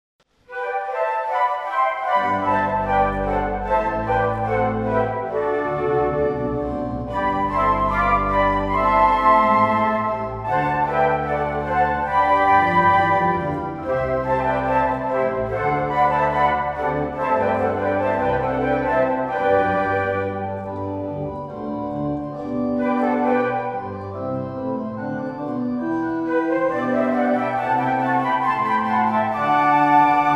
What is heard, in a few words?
Uccelli, Floeten